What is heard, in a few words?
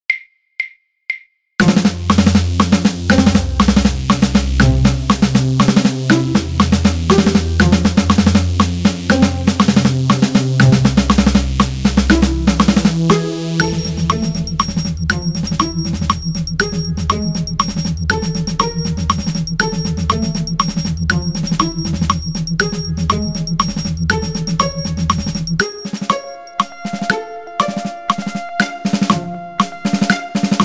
snare drum synth click 120 bpm